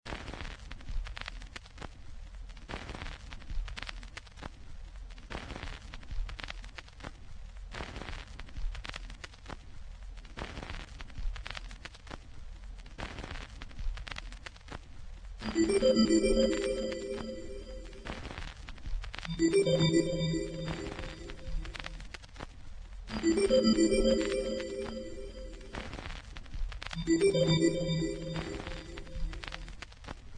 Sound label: signal